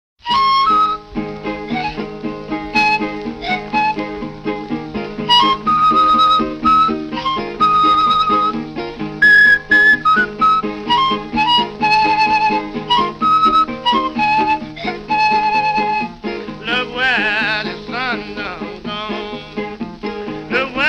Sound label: Red river blues, Flutes de Pan